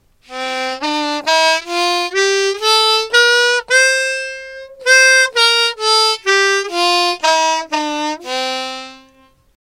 Harmonica; SBS Rast

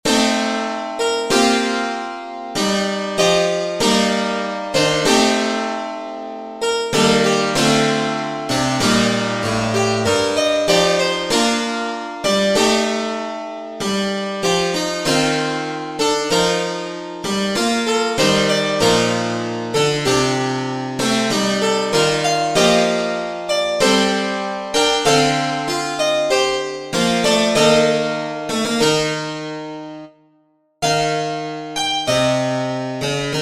Locrian Suite Sarabande 17edo

